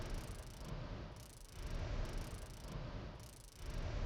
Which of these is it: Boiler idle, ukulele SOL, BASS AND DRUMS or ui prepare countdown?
Boiler idle